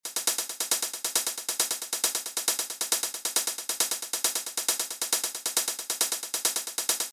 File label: hihat loop eq